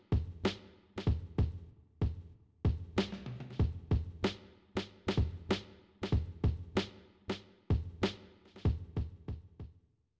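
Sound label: with drums inst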